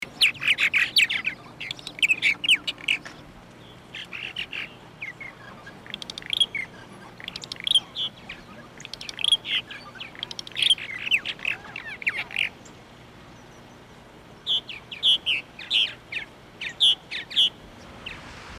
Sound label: purple martins sounds